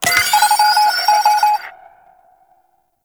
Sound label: Reinforcement Notifier; Fallen From Heaven